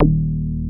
moog; basses

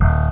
bass snap

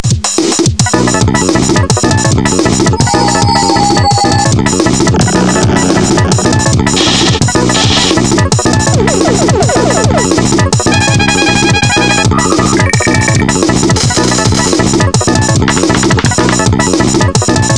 urban; ClickArt 300,000

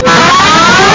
guitar slided

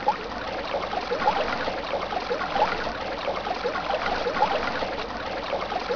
BUOY

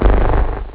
terror, drums bass; OctaMED SoundStudio V1

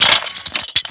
mg42 unpack, weapons foley; Spearhead Demo

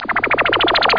Warp; bouncer

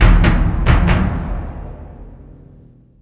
Big Drum

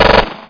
Uzi Pistol auto; SpecOps2MPlayerDemo

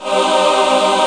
CHOIR; Descent

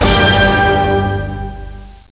splash; Let's Talk About Me!

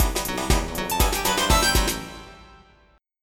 FILL, HOUSE

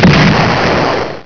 bomb

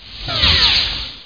bird in; austrlia

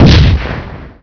claymore mine; SpecOps2 MPlayer Demo

